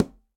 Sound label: Quinto C Tip, Conga, Latin Percussion; Drums; Drum Hits; Ableton; Factory Packs